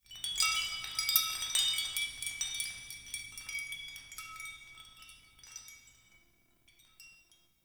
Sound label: Hut Interior Chimes; Midrath Island